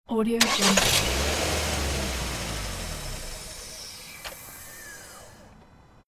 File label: car engine start stop